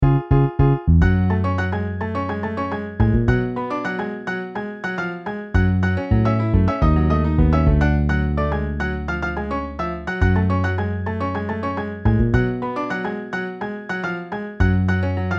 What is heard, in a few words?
Piano Bass MIDI